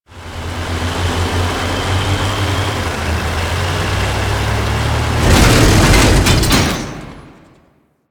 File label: Tank Intro Movement; Quincy; Warframe; NineteenNinetyNine; Stage12PartyOfALifetime